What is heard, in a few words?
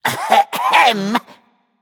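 non-verbal clear throat; smite; voicepack; jingwei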